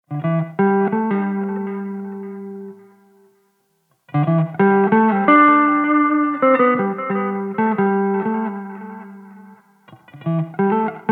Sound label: Jazz Guitar Riffs Electric Guitar Lick